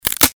bone breaks

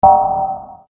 short ambient impact event

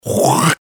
spitting

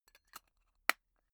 tobacco tin close

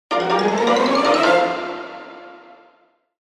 funny upward

